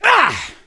melee swing, gambler, survivor voice; source engine; left 4 dead 2

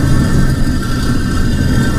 concrete block scrape rough loop

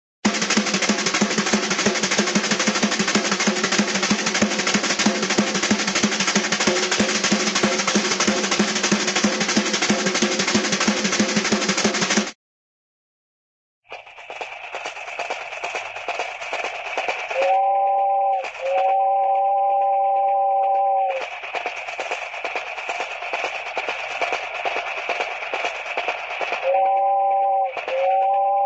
train, cartoon drum chugging, fast

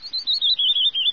wren; source engine; hl1; video games

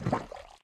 mud, footsteps walk; player; source engine; left 4 dead 2; boomer

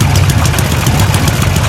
giant heavy loop; source engine; tf; mvm; video games

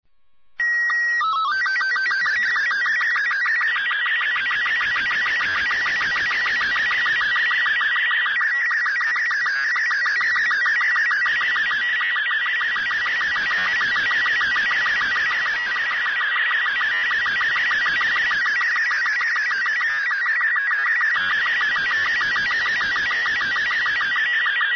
ambient; Portal 2; Source Engine; video games

